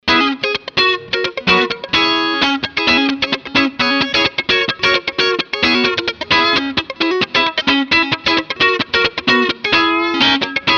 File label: stratocaster clean funky